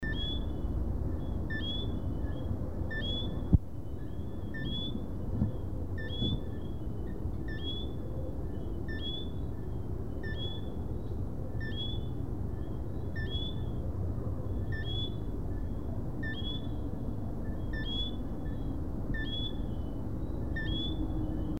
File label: Eleutherodactylus johnstonei; S Paulo; Silveira